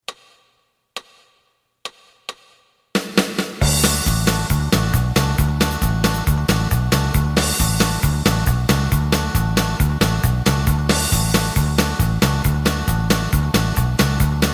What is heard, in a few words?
lead